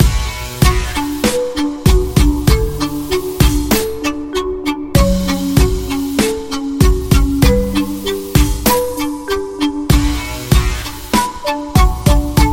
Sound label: electric violin